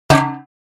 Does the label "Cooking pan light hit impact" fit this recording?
Yes